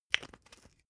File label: glass pepper close cap; berufe; gastronomie; soundpool